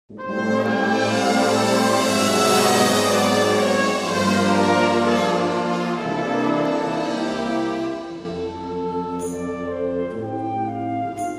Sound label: PIANOBAR